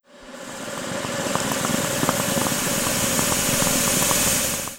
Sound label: pumpkin action charging; weapons